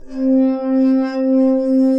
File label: Glass Harmonica (Multisampled), Ethereal Pads; Ableton; Mood Reel